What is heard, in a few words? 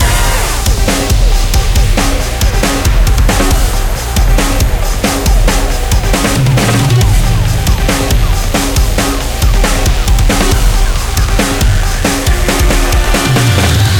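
Music Excite loop Base Loop; Crayola Scoot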